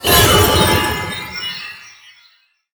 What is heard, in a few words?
Deepholm Geode Death